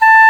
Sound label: Oboe D'amore; Roland L-CDX-03 Disk 1; Oboe 4-8